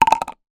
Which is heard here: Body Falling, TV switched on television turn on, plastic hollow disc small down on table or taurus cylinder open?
plastic hollow disc small down on table